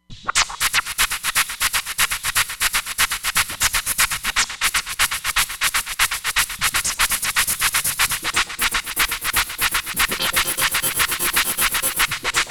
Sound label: Dirty Beat, Roland Fantom XR